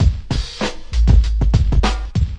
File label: 100 bpm hip hop, drum loops and breaks; 091 to 100 bpm